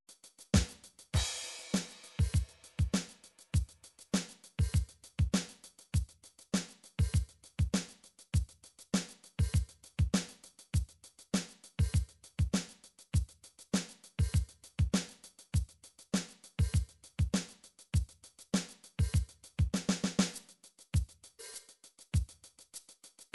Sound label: drums funk pop, midi drums